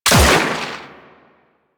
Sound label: Revolver Pistol Shot